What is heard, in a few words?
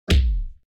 player punch